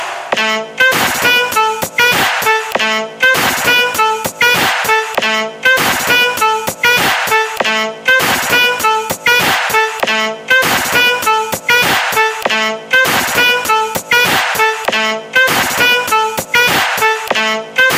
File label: line iphone 11